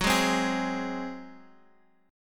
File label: G sharp 7th F sharp